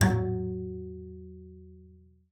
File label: bass, F#3; Xsample VOL-5